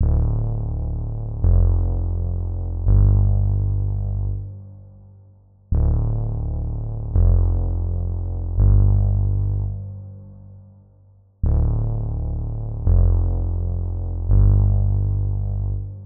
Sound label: 168 BPM D Min Bass; Melody Loops; Always Yours; Cymatics; ANALOG EVOLUTION Beta; RnB Collection